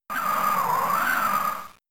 Gust; pokemon; gen 3; attack moves

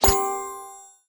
Star Bar; Angry Birds

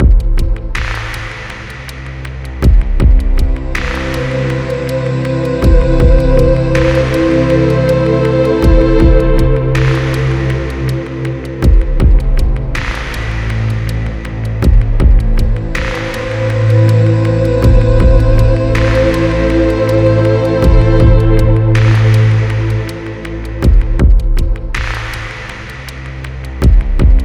Music Loading

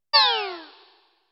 Desktop (Cancel); dot hack

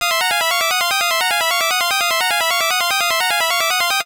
Bells Falling

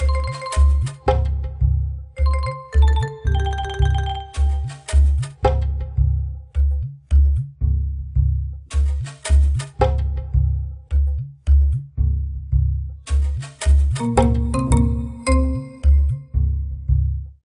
Jungle Level

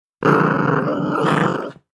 Ghost Growl; Don't Be Afraid The First Toy

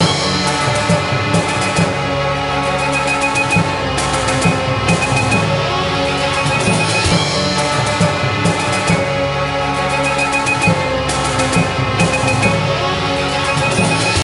Imperial Battle; Lego Battles 2009 NDS

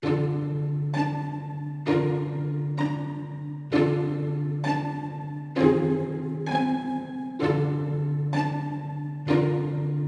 Stealth LOOP, soundtracks; bolt 2008 pc gamerip

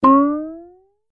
Cartoon Blooper

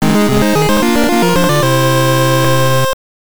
Minigame Event Clear; Mystical Ninja Starring Goemon; gameboy

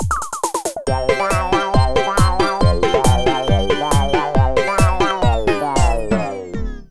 dance; Yo-Kai Watch; 3DS

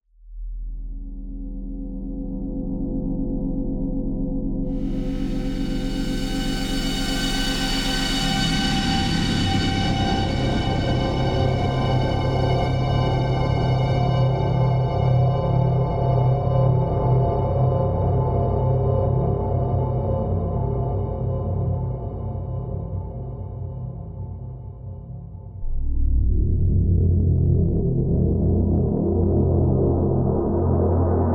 Ambience; Pluto

